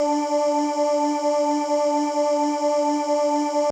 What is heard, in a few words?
kawai k1 VOICE LOOP; wavetables